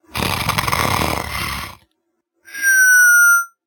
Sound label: Snoozi short